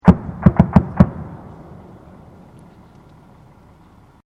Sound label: MK19 Distant Explosions, MK19 Grenade Launcher Distant Explosions; Grenades; VRSonic